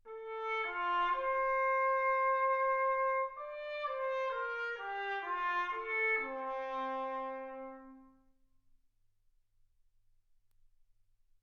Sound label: trumpet